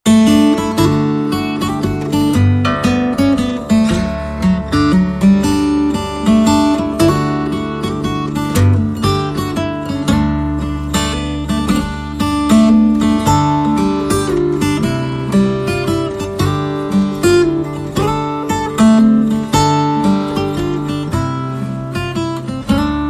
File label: Mazurka